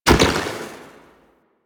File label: Ball Shoulder Check Burst; Missions; Tenno Ball; Tenno Scoop